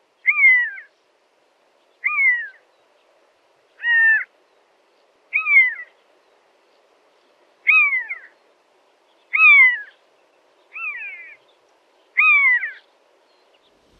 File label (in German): mausebussard